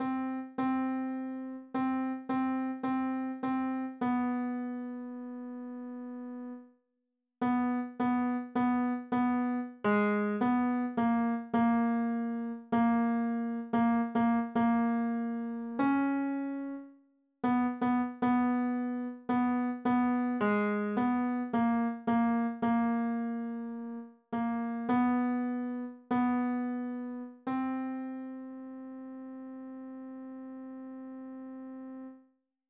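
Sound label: Summer, alto, andante; Holst; Alt – Summer vanaf Andante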